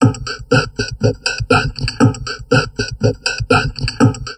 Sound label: TALK 120, Drum Loops Crazy Processed; USB Soundscan vol.07; AKAI